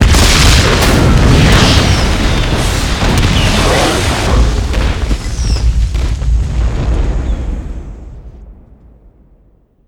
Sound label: explosion ammo dump; Explosions